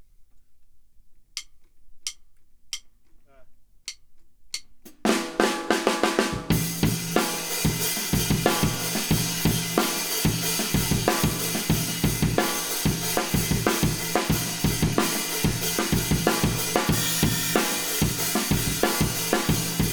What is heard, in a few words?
Kit